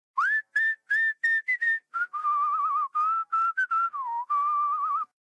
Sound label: Whistle; Infinix Smart 2 HD